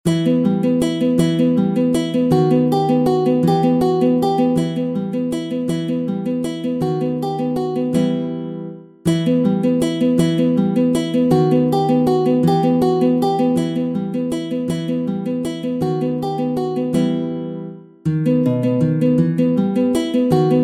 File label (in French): Walze, Méthode pour la guitare; Ferdinando Carulli; Op. 241; Classical guitar